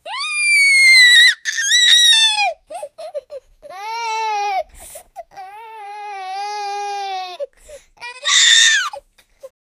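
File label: the child is crying loudly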